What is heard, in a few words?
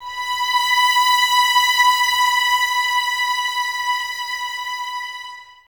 VS B 5, 11 Violins, Top; Miroslav Vitous; String Ensembles